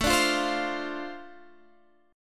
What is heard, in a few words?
D-Minor 7th A, chord